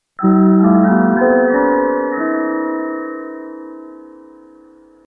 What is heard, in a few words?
4k 8nonPCM music